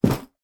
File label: foley bag baby changing drop onto floor; sound effects one